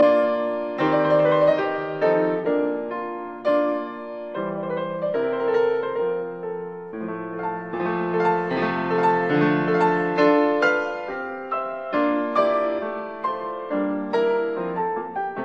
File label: Piano Sonata in B flat major, Rondo Allegretto; No 11; Op 22